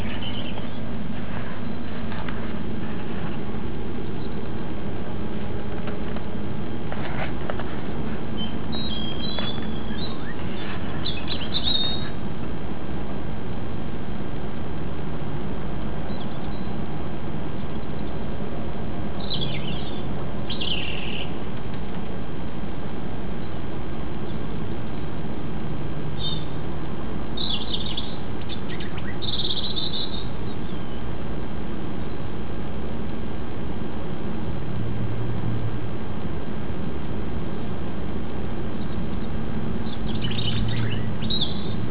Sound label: Bird Singing After Midnight